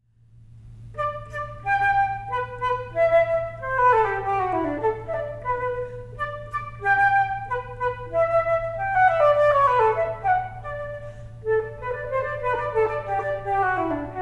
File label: Middle School Flute